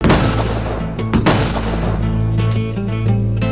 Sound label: death; singe